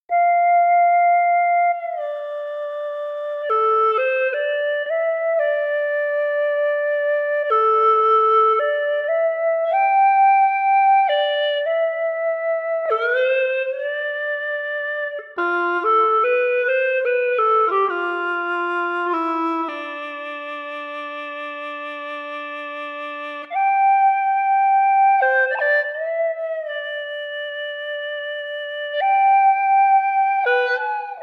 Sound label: bawu, Bawu Flute; Shan Bawu Flute; embertone; William Arnold